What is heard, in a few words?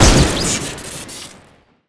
Arisaka Shoot Crit; tf2classic